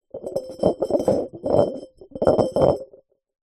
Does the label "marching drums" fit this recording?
No